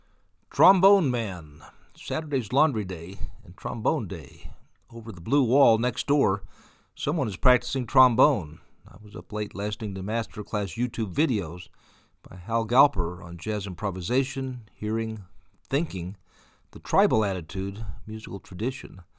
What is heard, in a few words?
trombone man